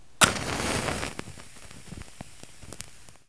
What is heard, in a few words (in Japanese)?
マッチ